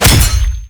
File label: Ghoul Devourer Body Impact; Warframe; Grineer Ghouls